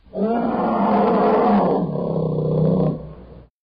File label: bear